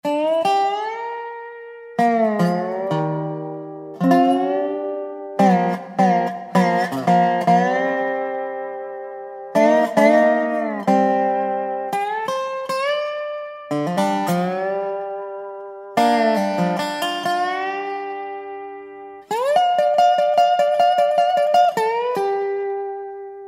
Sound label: DOBRO LICKS; Sonic Reality American Heartland